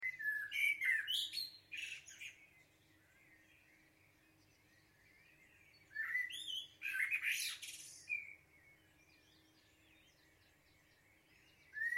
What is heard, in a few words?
blackbird